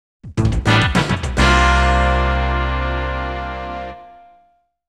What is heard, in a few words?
Bonus Game Failure; Nintendo All-Star Daibunto Smash Brothers